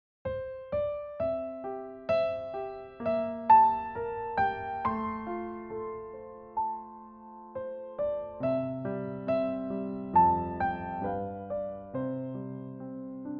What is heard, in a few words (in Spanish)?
Violin y Piano